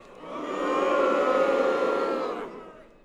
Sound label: Crowd Boo Medium; Vocals & Wallas